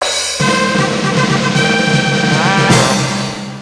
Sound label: fanfare; Wired for Sound Pro CD; Aristosoft, Inc.